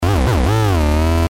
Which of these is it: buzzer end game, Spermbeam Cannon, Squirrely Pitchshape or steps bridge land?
Squirrely Pitchshape